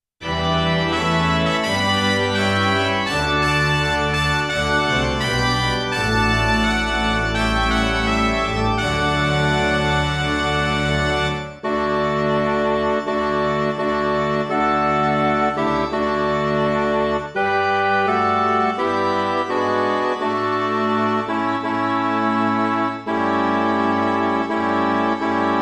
midi

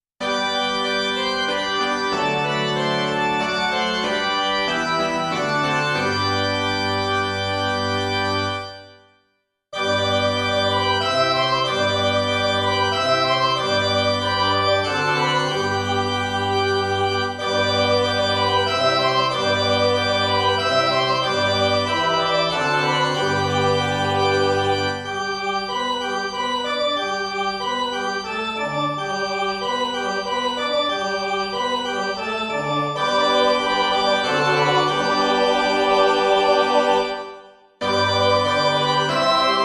midi

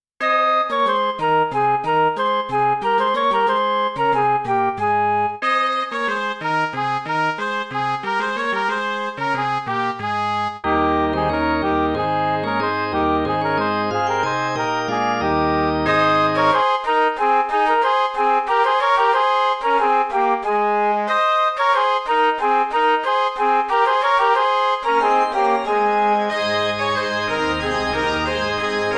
midi

